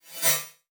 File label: Multimedia Internet CD-Rom Flash Whoosh Short